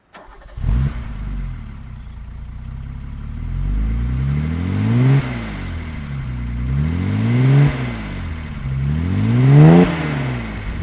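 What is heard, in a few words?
mercedes-benz 280sl manual throttle; Mercedes-Benz 280 SL 'Pagoda', 1970; Engine sound; V3D/ ClassicarImages; Marc Vorgers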